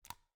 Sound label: Click